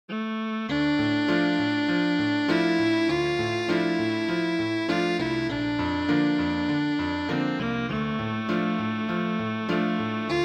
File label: Duet For Violin and Piano